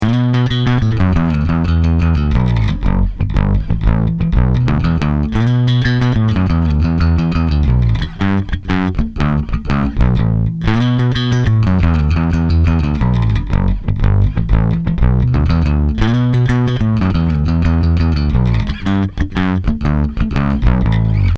staccato; tc electronic

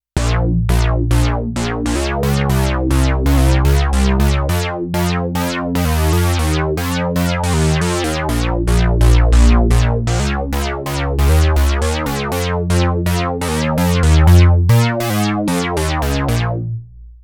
OB-X8 Unison detuned Bass

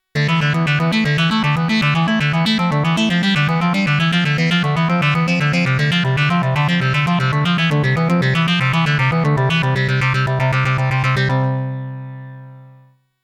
Modal Craft Synth 2.0

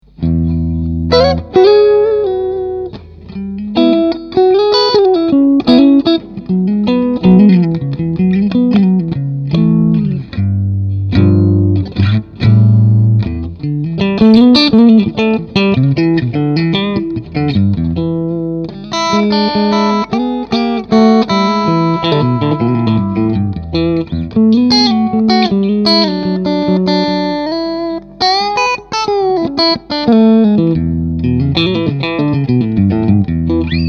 Tone King Sky King clean channel

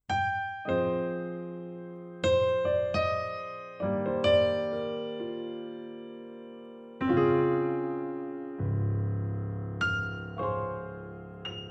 Piano; Gewa PP 3

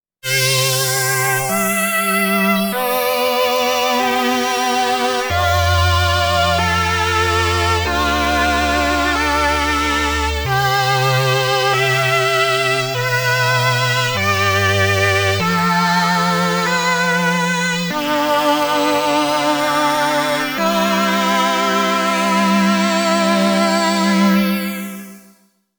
Sequential Pro 3; ChamberTron

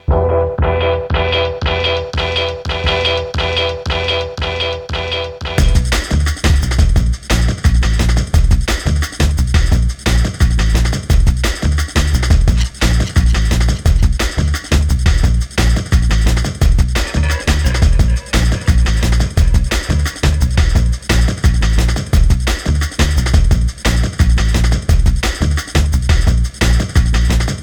IQ Series Limiter V2 MXD C hard; HOFA

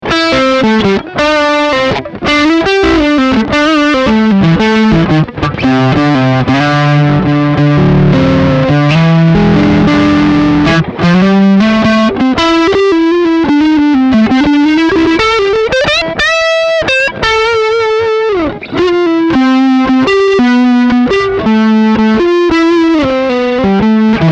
Speaker Sim Boost

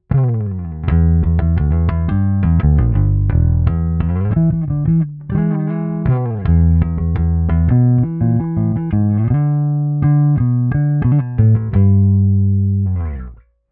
bassboost

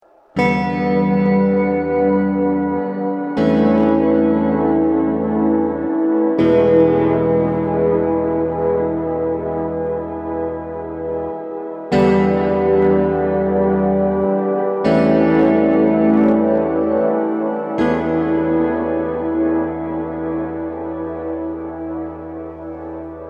Keeley Hydra Space

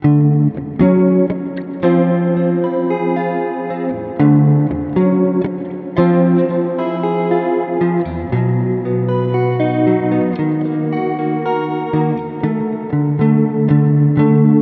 Beebo Chorus Flanger; Poly Effects

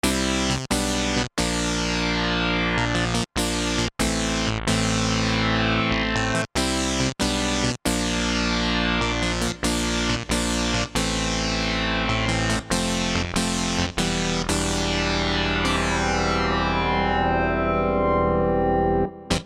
MiniMax

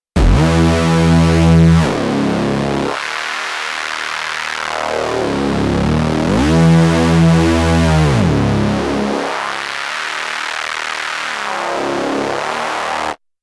KOPRO+ Rude Bass

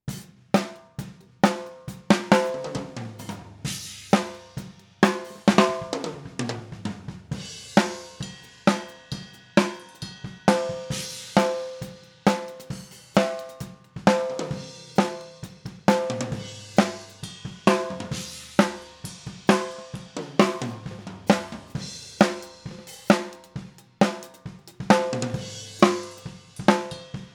snare sm 57

